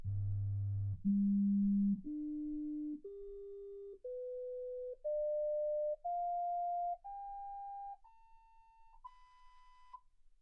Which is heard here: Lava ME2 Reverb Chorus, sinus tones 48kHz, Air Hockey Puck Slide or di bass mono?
sinus tones 48kHz